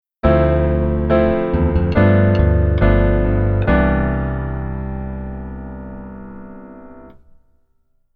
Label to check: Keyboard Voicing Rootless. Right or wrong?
Right